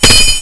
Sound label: katana hit wall; cstrike